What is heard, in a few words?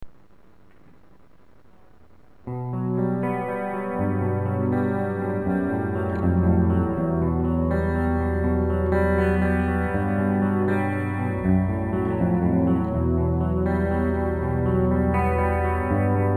slow acoustic